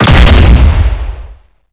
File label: explode; svencoop